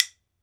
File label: WOOD BONE, Ireland